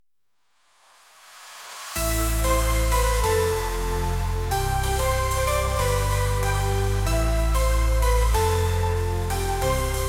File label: Techno Trance